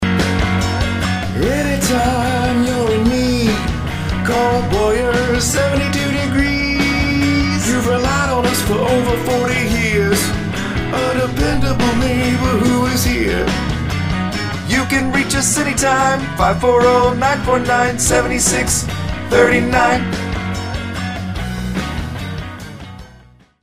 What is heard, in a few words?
Jingle Main; Boyers